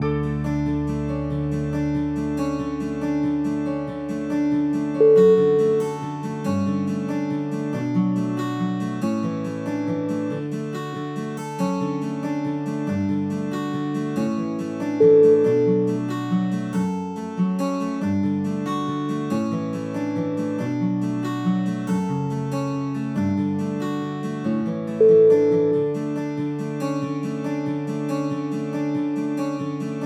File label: acoustic warmth